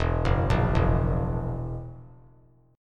E Suspended 2nd Flat 5th E down; arpeggiated; JGuitar

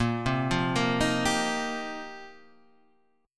F 9th Suspended 4th Bb, chord

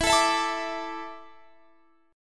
A Suspended 4th E

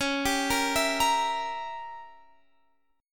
C sharp Diminished 7th C sharp Guitar Standard; C#dim7 arpeggiated; JGuitar

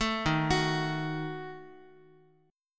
Eb Diminished, Guitar; Ebdim arpeggiated; 12,11,9,x,x,x; JGuitar

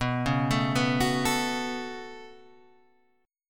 B 7th Suspended 2nd, Guitar, Standard; B7sus2; arpeggiated; JGuitar

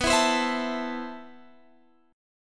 A Minor B, guitar; Am/B strummed

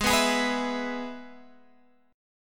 Ab Minor Sharp 5th, Guitar, Open G

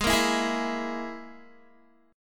Ab Suspended 2nd Sharp 5th, down, Guitar; strummed; 4E,4A,5D,5G,5C,6F; JGuitar

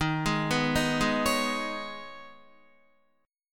Eb 7th Suspended 4th Sharp 5th, Guitar; Eb7sus4#5; arpeggiated; 11,11,9,8,8,8; JGuitar

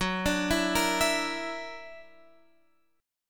Gb Augmented 7th Gb down Guitar Standard; Gb+7 arpeggiated

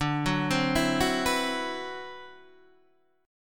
D 13th D, down, Guitar, DADGAD

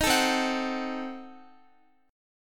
C Diminished 7th, Guitar, chord; strummed; Cdim7; x,x,13,14,12,13; 4E,4A,5D,5G,5C,6F

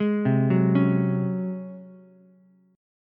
Ab 11th down Ukulele C6; arpeggiated; JGuitar

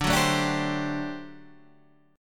G Major 7th Suspended 2nd Suspended 4th

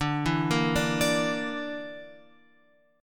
D Suspended 2nd Sharp 5th D; Dsus2#5 Chord {10 7 8 7 X 10} arpeggiated

